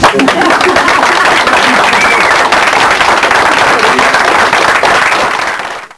Win; StarBound

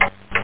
time bomb tick; WormWars

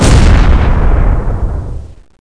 med, impact explosion; SINDEMO

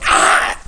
grunt; Requiem

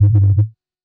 BASS SHOT HOUSE 125 A; eJay; Singleshots

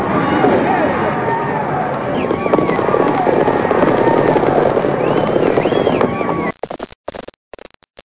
horses

